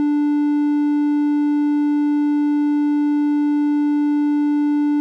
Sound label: Panel Nutrient Oxy; Among Us